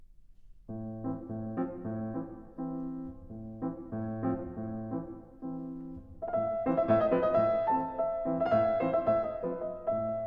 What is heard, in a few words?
Humoreske, Piano and String Orchestra; Ole Olsen; Little Suite for Piano and String Orchestra; 1927